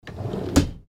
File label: door sliding close